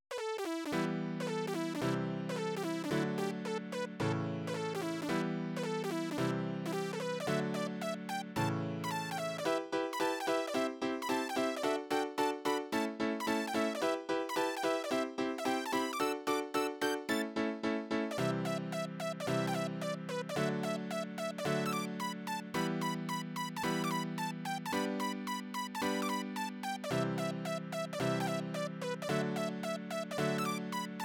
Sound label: Piano Klavier